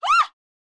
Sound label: woman fear scream; soundscrate